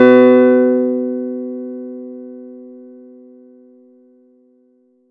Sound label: Chord; ModelCycles